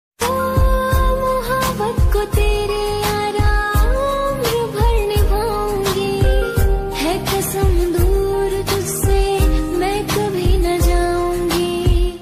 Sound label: Female version hindi ringtone